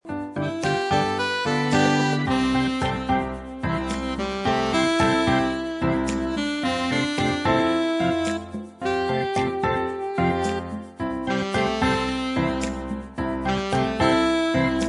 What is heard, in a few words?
bgm plaza; platform; Brazil